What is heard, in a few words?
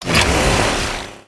ripsaw